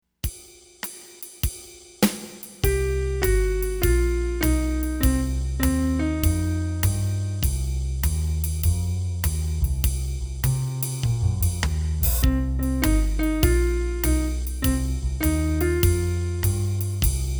Blues Conversation, piano

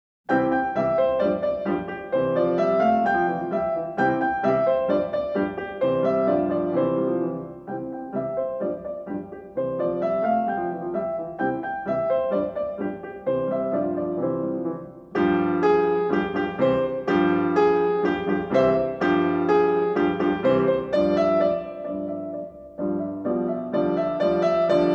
Happy Red piano

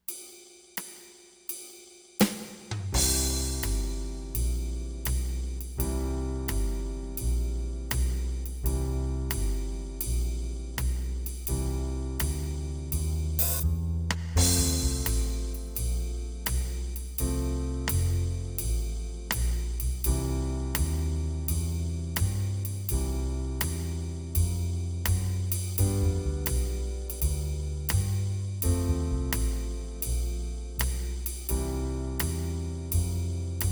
12 Bar Blues

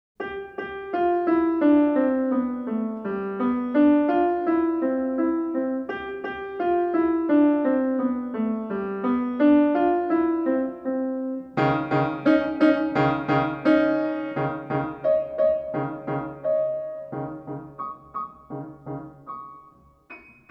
Piano Piece piano; A Particularly Pleasing Piano Piece

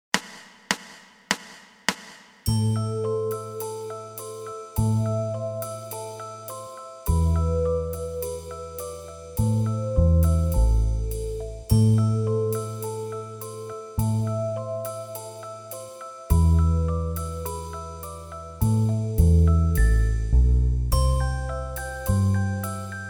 Etude In A Minor; Kohler

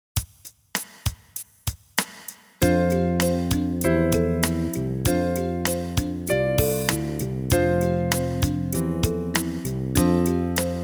Sixth Sense Slow, piano

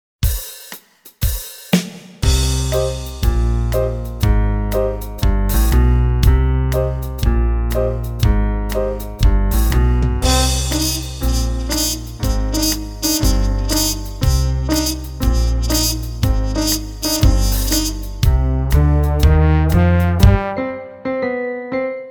Boogie Slow

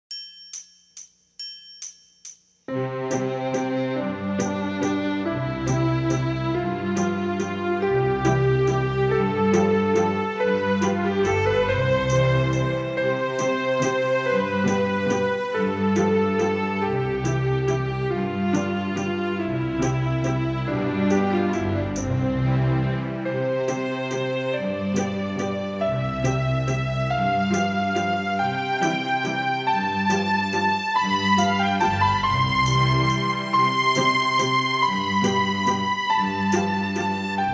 Scale Waltz Slow

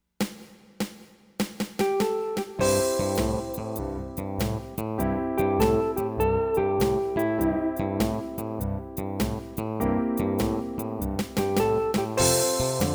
Barrelhouse Blues

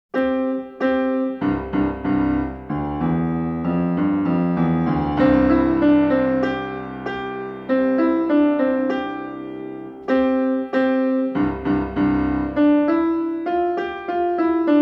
piano

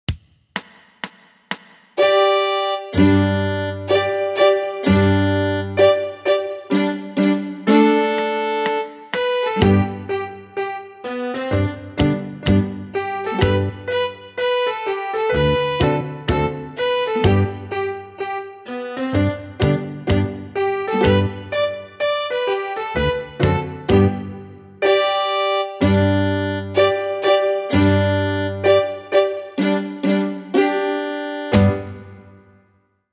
American Fiddle Tune Slow

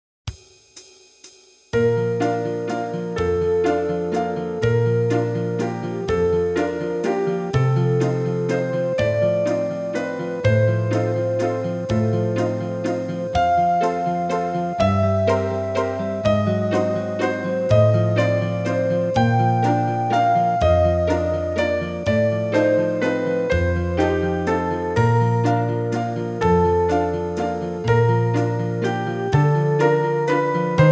Waltz Slow; Sleeping Beauty Waltz; Tchaikovsky

